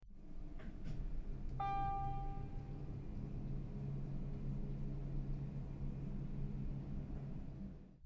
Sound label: elevator hum